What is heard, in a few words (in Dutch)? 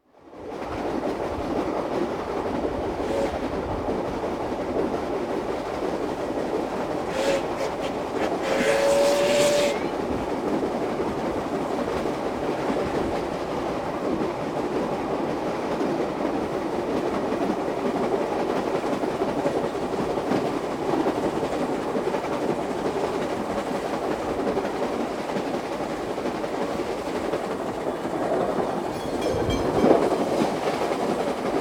Stoomtrein Door Overweg; KMP Film Video Sound Effects